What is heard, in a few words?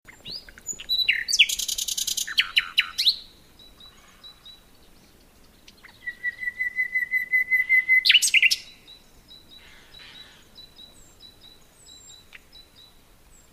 Nightingale